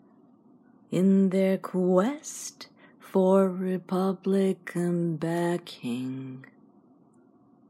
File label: Speech sung